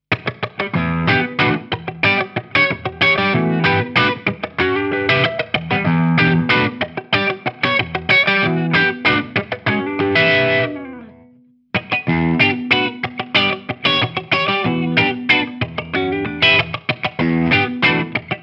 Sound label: Clean Voice 3 Way Switch